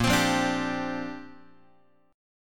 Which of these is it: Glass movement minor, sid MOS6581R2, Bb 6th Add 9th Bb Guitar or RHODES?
Bb 6th Add 9th Bb Guitar